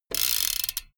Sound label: bmx bike crank freewheel chopped long